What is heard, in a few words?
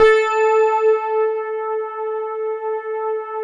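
GLASS Rhodes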